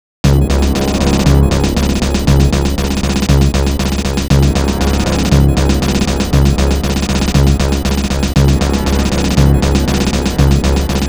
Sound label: Incoming Message; Judge Dredd; SNES; Type A